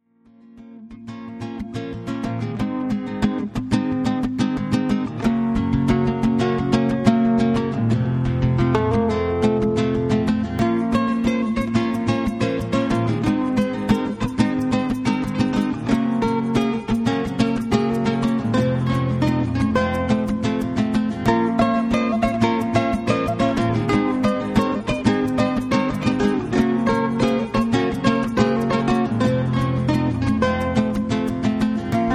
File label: Latin, Acoustic Guitar; Wedding Los Angeles